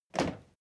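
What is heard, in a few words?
step crowd march normal single right, footsteps; schritte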